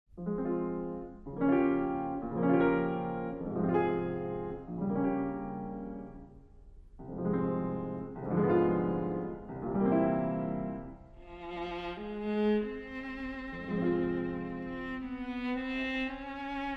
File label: Clarinet, Viola & Piano, Andante